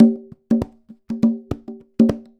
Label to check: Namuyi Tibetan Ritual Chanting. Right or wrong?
Wrong